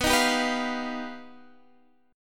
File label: G Augmented 7th